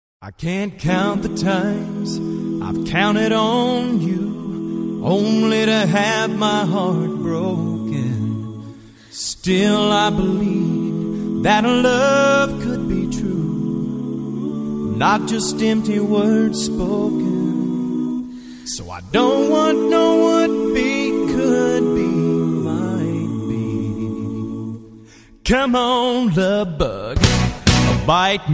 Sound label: sad song ringtone